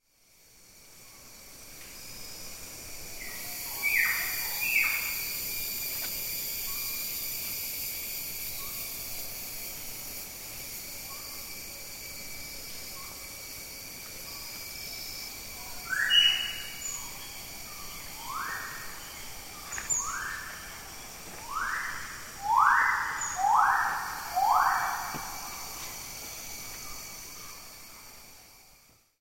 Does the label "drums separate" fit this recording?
No